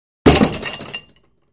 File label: Free Weights Exerci